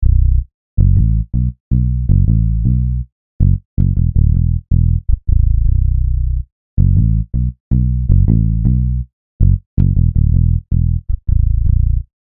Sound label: Bass